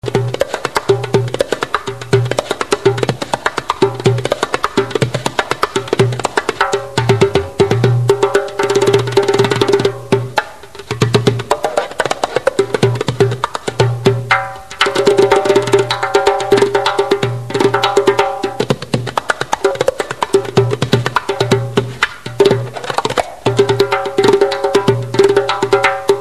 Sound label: dumbak